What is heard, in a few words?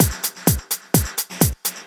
Clubhouse Loop 128 BPM